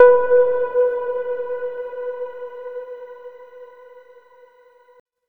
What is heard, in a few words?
Overlay Tone